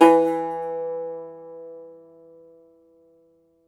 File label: Cumbus Saz; E-MU Producer Series Vol. 7 – Old World Instruments